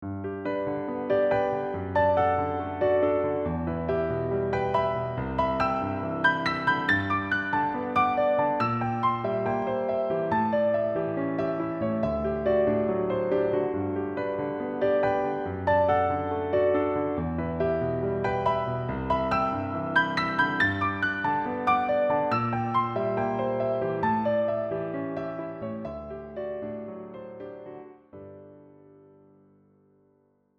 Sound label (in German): akkordbrechungen; praxis spieltechnik